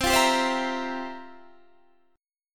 F Major 7th Suspended 2nd Suspended 4th, C, guitar